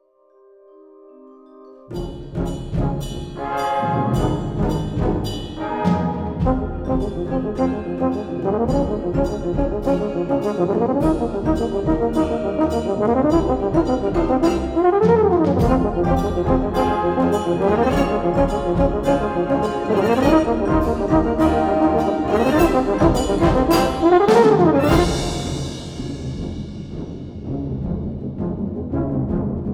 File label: concertino for euphonium